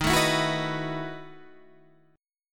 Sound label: E Major 7th Suspended 2nd Suspended 4th D sharp